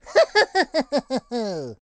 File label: Laughing; Postman